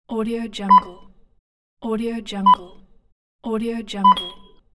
checkout beep

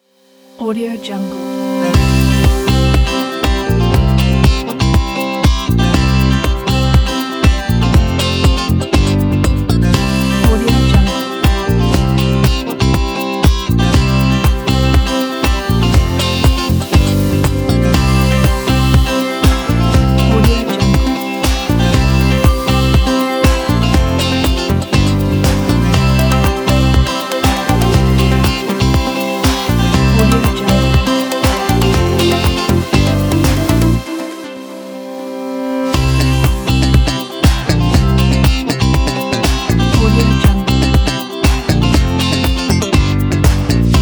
funny funk

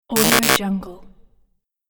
robot chatter

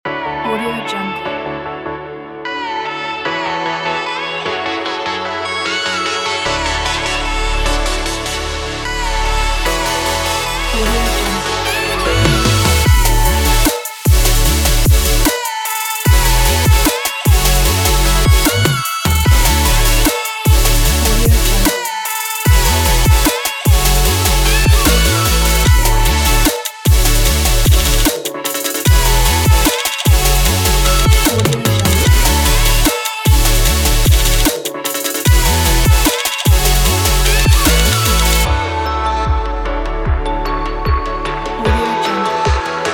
future bass

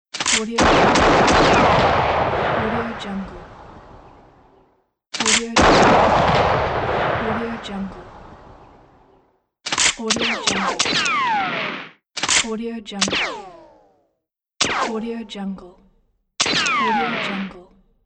spaghetti western gunshots

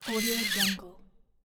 small servo motor

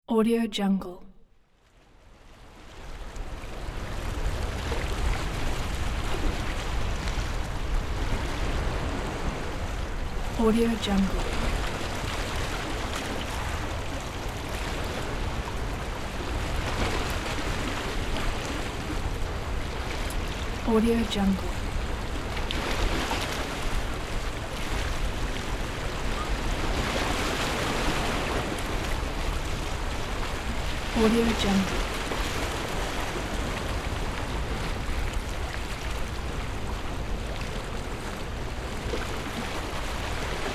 calm lake shore

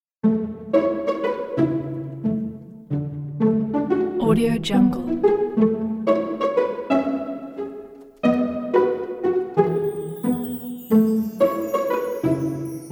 cartoon music

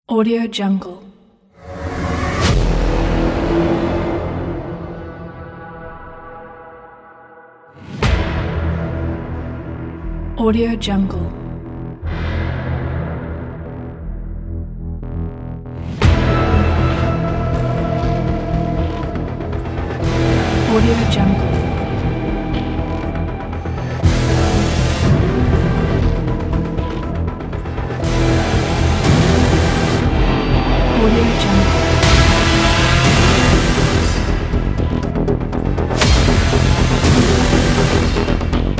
aggressive modern action trailer

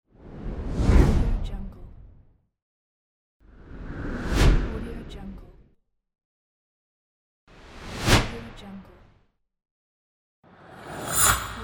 whoosh

